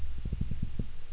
Pseudotropheus fainzilberi Growl

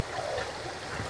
Oncorhynchus kisutch Gurgle

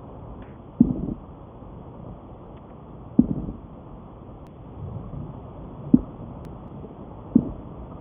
Lutjanus griseus Knock; Grey snapper

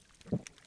Opsanus beta Grunt

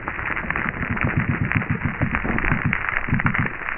Glaucosoma hebraicum Knock

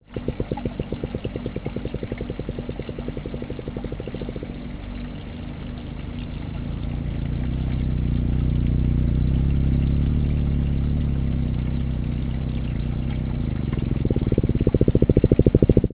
Melanogrammus aeglefinus Hum Knock

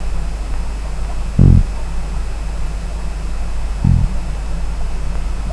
Gadus morhua Grunt Aquarium, fish

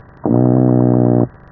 Argyrosomus regius Long Grunt

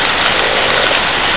Unknown sp Sound

